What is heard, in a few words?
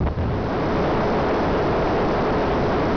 Hornet AB Engine; F⁄A-18 Hornet; F/A-18 Hornet 2.0 Demo